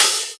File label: Open HH, Cymbals